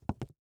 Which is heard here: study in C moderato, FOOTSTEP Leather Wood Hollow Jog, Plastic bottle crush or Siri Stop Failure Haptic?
FOOTSTEP Leather Wood Hollow Jog